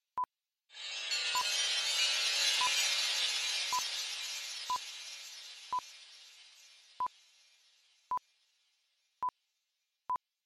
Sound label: Sound Design Chatter Glass Shatter